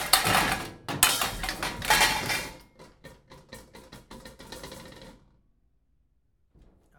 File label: Pots N Pans N Trashcan